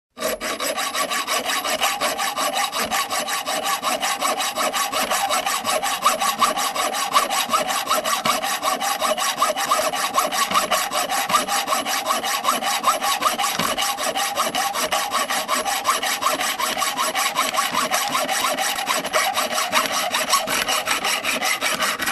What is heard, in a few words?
Hand Sawing metal up-close